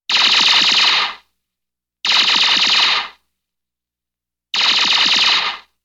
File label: Laser Shots